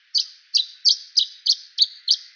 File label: bird, ambient animal